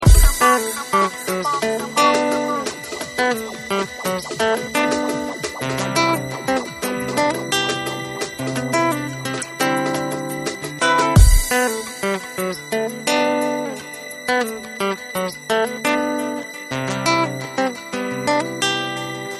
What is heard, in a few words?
electronic drumnbass brasil; sampler electronic